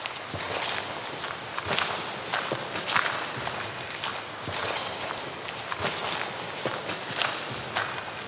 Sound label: dub techno loops loop